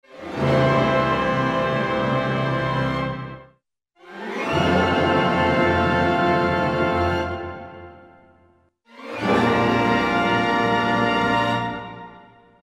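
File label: Orchestra Major Glissando; Roland L-CDX-04 Strings And Orchestral Percussion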